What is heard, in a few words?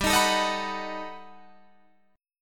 G Augmented Major 9th, Guitar, Open D